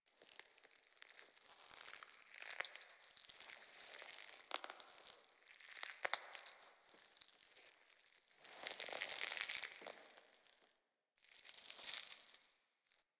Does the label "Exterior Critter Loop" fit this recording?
Yes